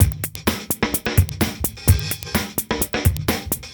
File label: Houseloops 128BPM; Vengeance Samples